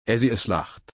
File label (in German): lachen